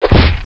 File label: punch wall